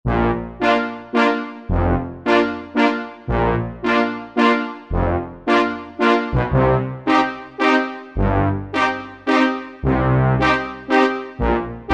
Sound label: Brass Orchestra, Korg Wavestation A-D